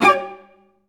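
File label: clarinet; Best Service; Peter Siedlaczek's Advanced Orchestra; CL FX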